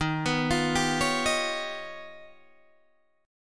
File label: D sharp Augmented 9th D sharp Guitar Open D